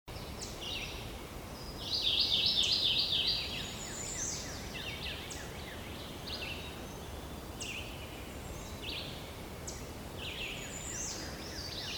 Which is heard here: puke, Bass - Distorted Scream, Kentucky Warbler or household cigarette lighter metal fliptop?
Kentucky Warbler